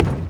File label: Bleachers Perc, percussion; HOUSTON; Moody Park